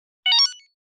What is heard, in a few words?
pirupip